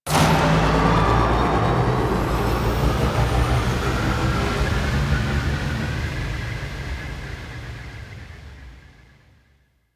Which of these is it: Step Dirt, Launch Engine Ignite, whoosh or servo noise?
Launch Engine Ignite